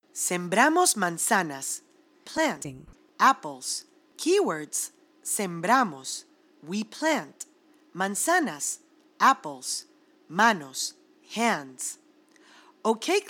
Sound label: Planting Apples Spanish; Fruits